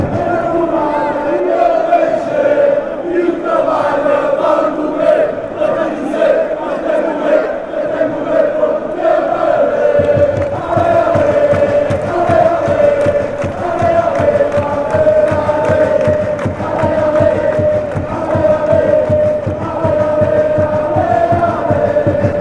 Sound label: allez allez, chants